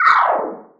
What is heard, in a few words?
sci fi